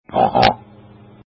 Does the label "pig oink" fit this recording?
Yes